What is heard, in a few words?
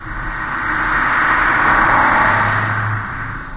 ambient air exchange; hl2